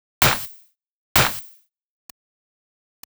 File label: Clap Snare